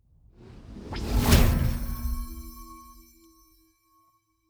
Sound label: magic warp personal jump small; swooshes-swishes